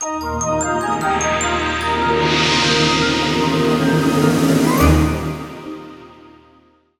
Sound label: Ident